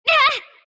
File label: sylph pain; Dark Willow